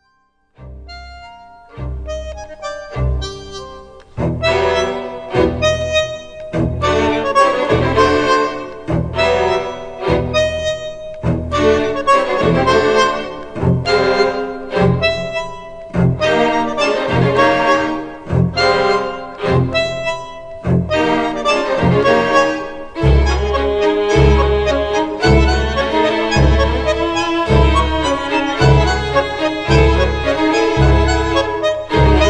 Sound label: Tango